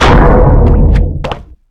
trap wreckingball

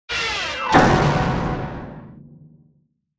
drop piano miss

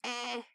armadillo indifferent

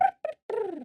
kiwi short

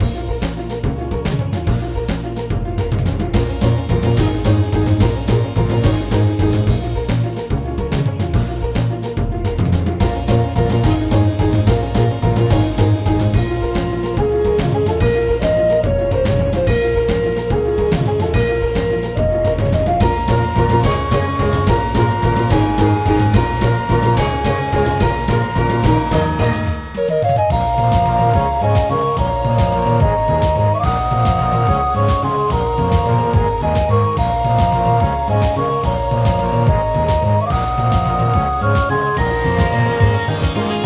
battle

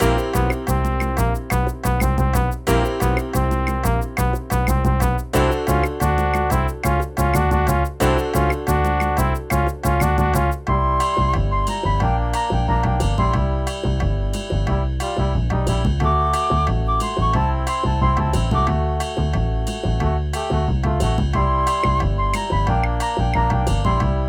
bgm

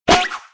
tag

